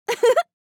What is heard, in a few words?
Woman giggling short